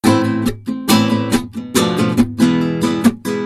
latin guitar; lmms

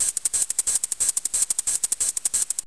rave hihat; lmms

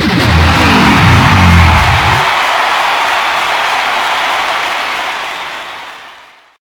rock sound; fofix

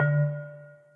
dong; lmms

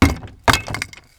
lock; gcompris-qt

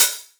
hihat opened; lmms; drums

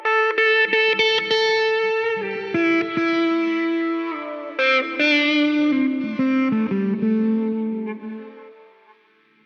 electric guitar; gcompris-qt

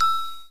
xylofon son; melody; gcompris; melody activity

